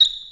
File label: Squeek; CD Action; nbajam